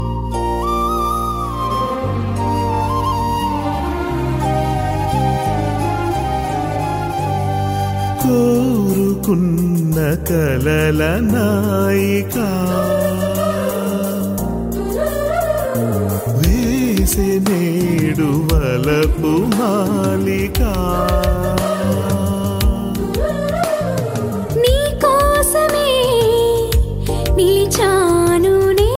telugu ringtone

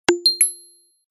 dropping coin sms ringtone; galaxy

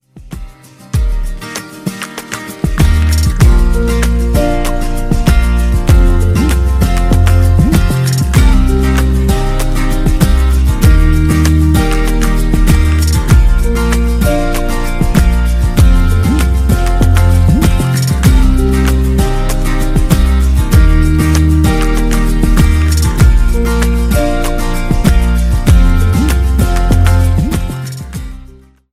integrate ringtone, galaxy ringtones

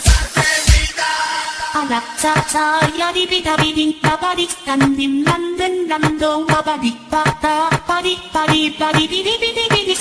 english boys ringtone

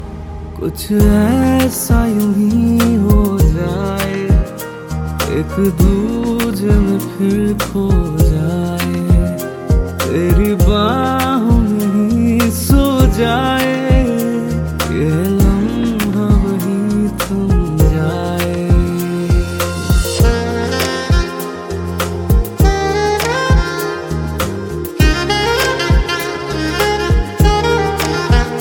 bollywood ringtone

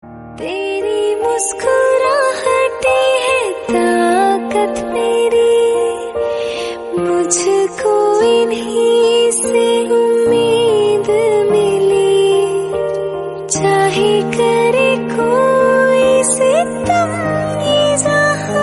bollywood love song tone ringtone

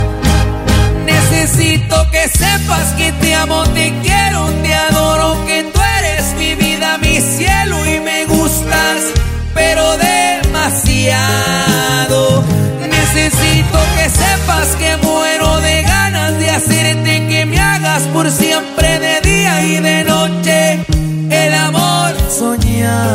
call tone ringtone

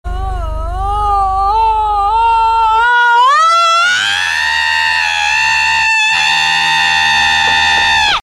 the alarm clock ringtone

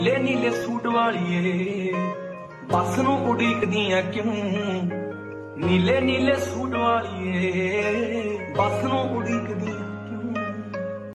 punjabi ringtone

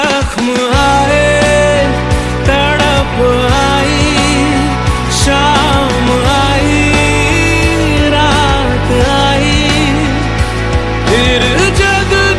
hindi ringtone, bollywood ringtones

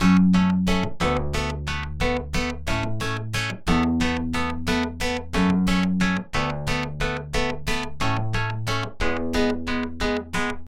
happy glad joy memory musical tone ringtone

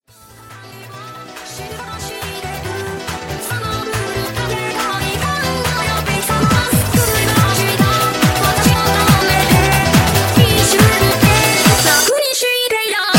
alarm butterfly hit ringtone

